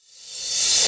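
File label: Reverse Cymbal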